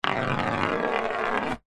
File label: foley ballbearing roll across wood surface; zapsplat